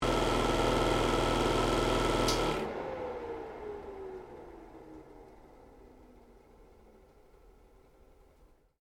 AIRCONDITIONER NOISY STOP, A noisy motel airconditioner; Household; Air Conditioners